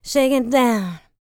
licks, vocal, dance; ILIO; Vocal Planet

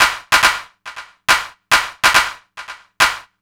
NRG Percussion, Trance; Sonic Foundry (Sony Creative Software); Trance NRG VOL-1

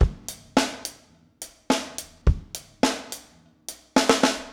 Garage Funk Drums Fill, 70s Analog Funk; Sonic Foundry (Sony Creative Software); What it Is!

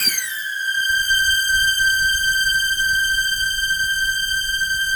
SQUEAK G5, Yamaha VP-1; SQUEAKSTRNG2; Music Store

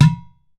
UDU SLAP, African Perc; E-MU Formula 4000 Series Vol. 4; Earth Tones

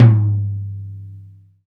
tom, Roland; Club-50 - Foundations Roland; Ext.Snare 2

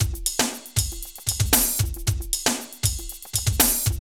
Drum Loops

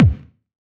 kick, Hardcore Breakbeat; Big Fish Audio; Hardcore Breakbeat Action

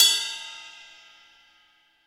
Rock Ride Bell, cymbals; Roland BK-5

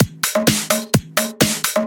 Latin Loop 128 BPM

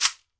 SHEKERE, African Percussion; E-MU Producer Series Vol. 7 – Old World Instruments

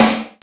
SD VRB, Yamaha RY-10

